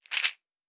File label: Pills